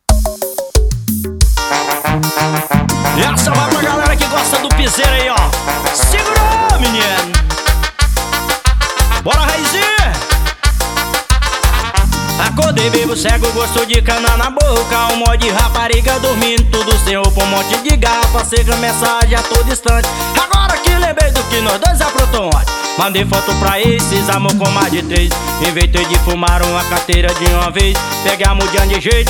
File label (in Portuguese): forro